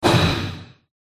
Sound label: div mount attack contact middle